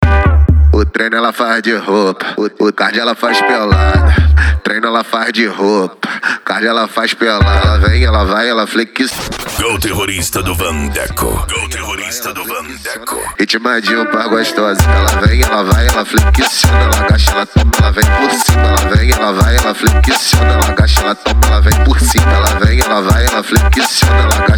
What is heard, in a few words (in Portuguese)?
dance e funk mega bass